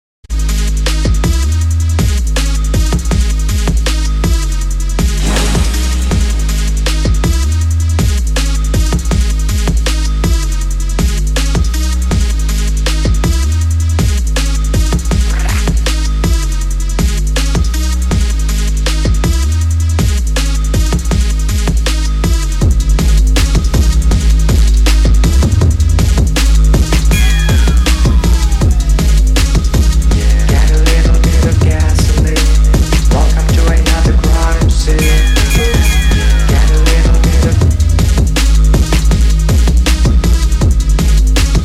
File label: hip hop